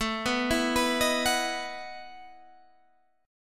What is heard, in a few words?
E Major 7th Suspended 2nd Suspended 4th